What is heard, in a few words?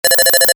Computer Beep, Short; Multimedia; Stuart Duffield